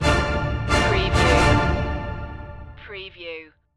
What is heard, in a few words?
stinger no choir